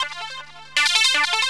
synth, Drum+Bass, 160, C; Keys

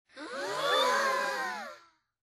surprise; WMBonusXP

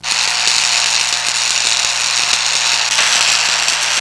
ARC WELD; Easy Personal Web Page

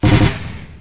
Gas Ball; The War Engine

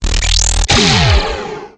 PLASMA; wipeout

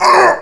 pain, player; QUAKE2; male

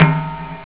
cowbell; drums

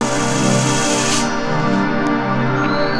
SYNTH D+B 160 C, Drum+Bass, Keys; eJay

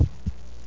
heartbeat (loop)

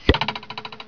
arrow; Custom 3D Home

